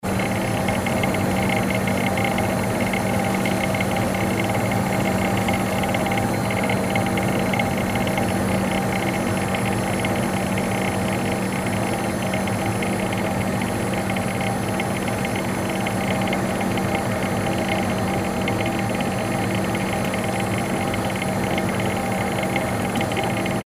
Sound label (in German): eismaschine